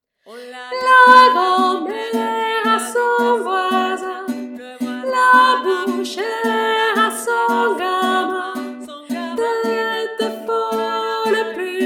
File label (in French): VOIX AIGUE